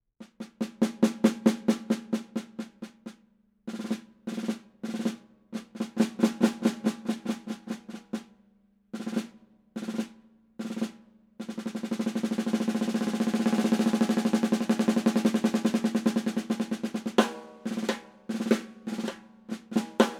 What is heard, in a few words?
Rogers 7 line Dyna